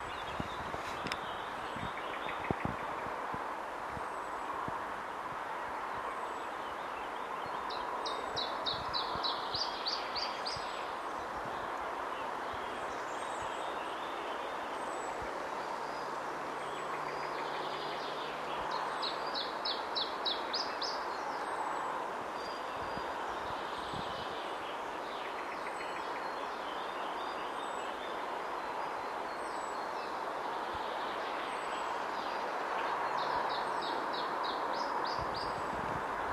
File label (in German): iberischer zilpzalp